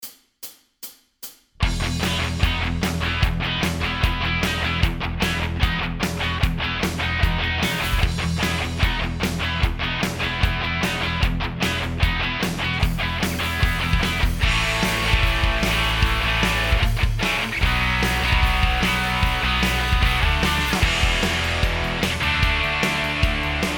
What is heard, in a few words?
G cad Backing Track